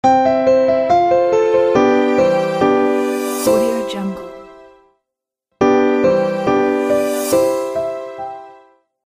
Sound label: bright piano logo